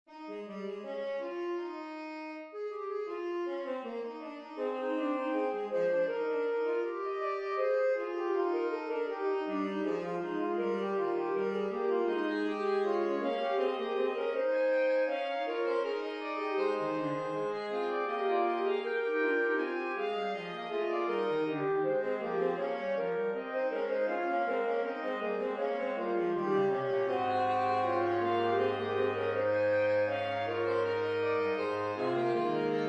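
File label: Fugue in A flat, Sax Quartet; Smetana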